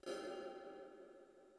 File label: PERC, hi-tech kits; Crypton - DrumBase5000